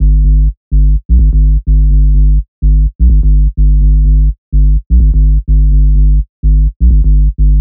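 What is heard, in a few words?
126 G minor sub bass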